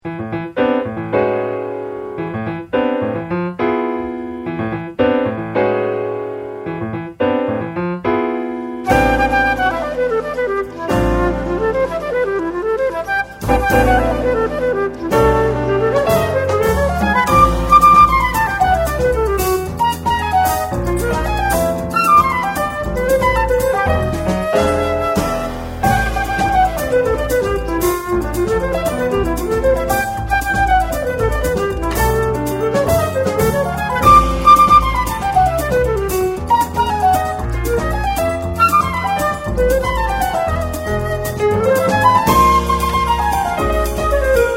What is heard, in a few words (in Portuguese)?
forro